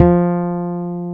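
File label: fretless bass E3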